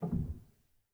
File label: rel, Grand Piano; bitKlavier Grand; Piano Bar